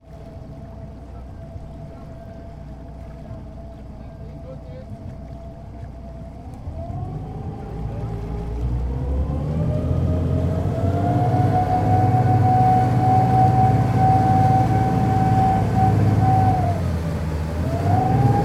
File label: transport boat motor yacht 700hp dual engine idle then rev to full speed; bavaria; tom chapman